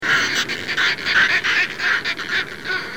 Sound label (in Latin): Fulmarus glacialis